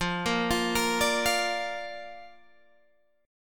Bb Major F

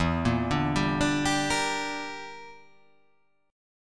E Minor 7th Flat 5th E

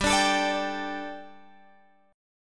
G Suspended 2nd G, down, Guitar, Open F; Gsus2; strummed

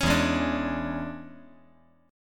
E 13th

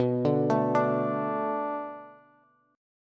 B Minor 7th B Bass, chord; Bm7; arpeggiated; JGuitar

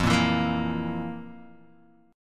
F Major 7th Suspended 4th, Guitar, DADGAD; down; chord shape

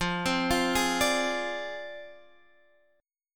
F Minor 6th F down Guitar Standard; Fm6; arpeggiated; JGuitar

